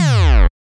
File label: Hit